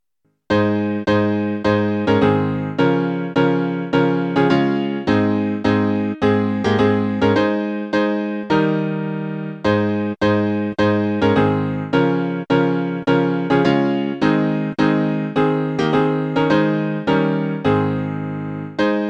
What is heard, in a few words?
Leaning on the Everlasting Arms, piano